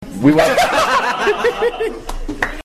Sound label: laugh